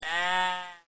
sheep

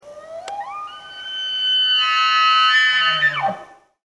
monster bugle

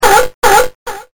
Sea Lion

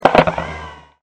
CAMERA

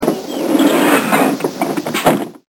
bus door slide

